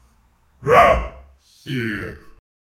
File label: the sound of a man, superhero